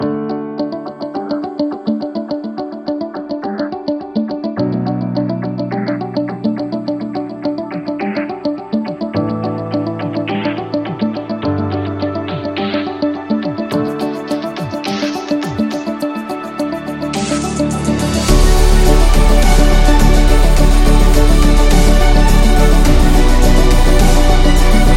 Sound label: The Epic Dubstep, corporate music; corporate music vol 11